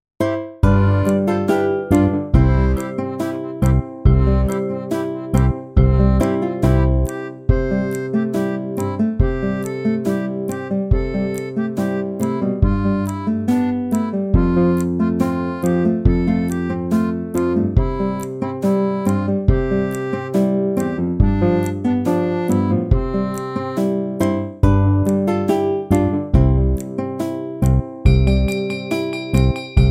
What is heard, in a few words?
Karaoke